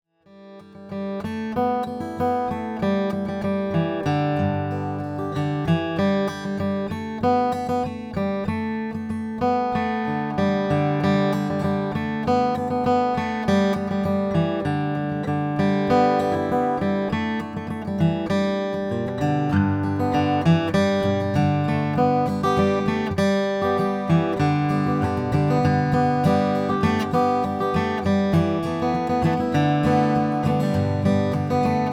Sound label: franklin om madagascar rosewood; 2008; brazilian rosewood